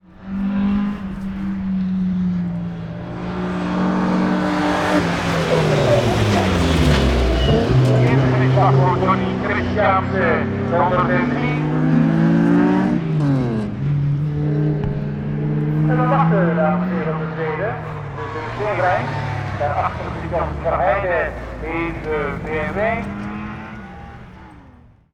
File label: Rally Cross Passing By And Commentator